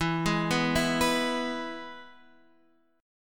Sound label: E-Major E, Guitar Half Step Down